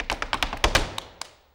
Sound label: STEP + CLAPS, MSTEPS+CLAPS